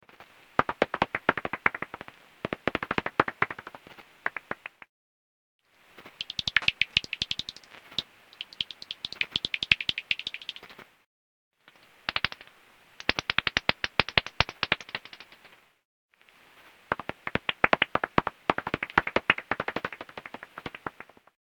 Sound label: Pipistrelle Tuning